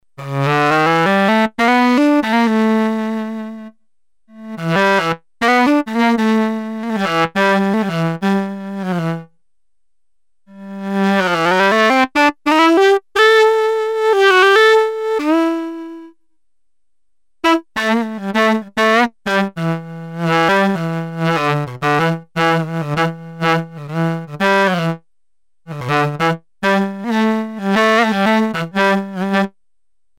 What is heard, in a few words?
sax; vst